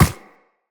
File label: Run Ice, Footsteps; Characters; Operator